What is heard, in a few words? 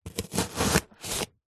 foley cardboard box cut stab and remove utility knife; zapsplat